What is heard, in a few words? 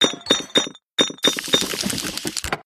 glass Bottle